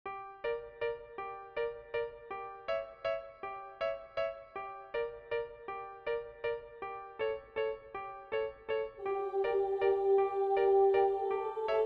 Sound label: menu; Bildschirmflausch-LD41